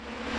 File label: aliens